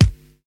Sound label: kick sound g key; single-hits-one-shots